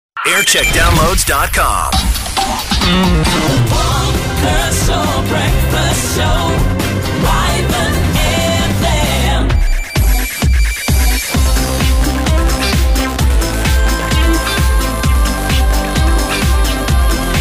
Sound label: Jingle Montage; Wyvern FM Worcester; Music 4; Radio Wyvern; 2000s